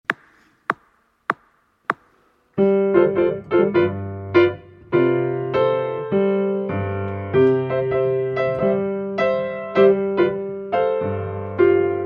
piano only

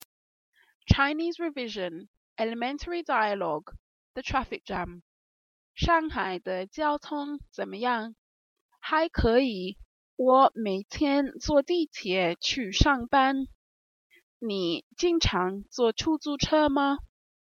the traffic jam

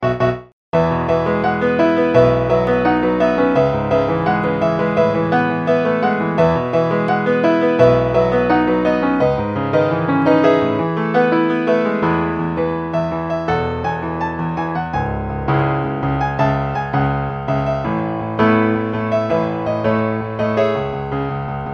piano

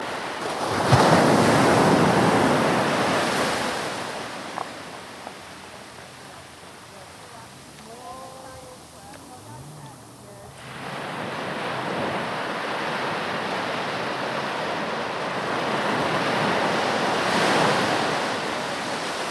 breaking waves at castlegregory beach

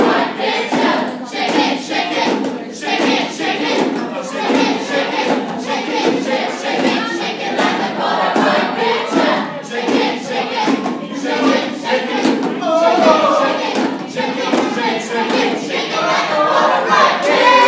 break time entertainment from the ukelele group; yorkstjohn

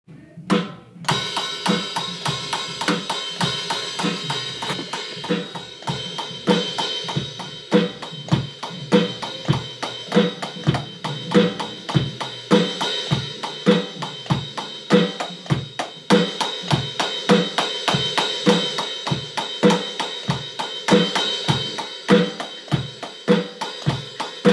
live drum recording

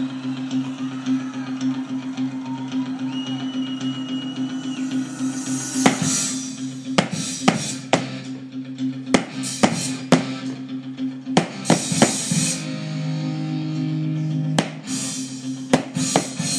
drums on guitar hero

